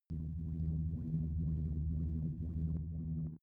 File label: space shuttle engine; raumschiff